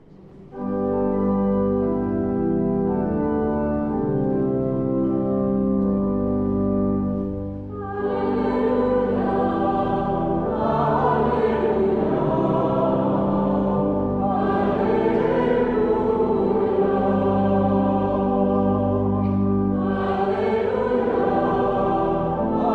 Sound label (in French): Tutti; Alleluia Colin Mawby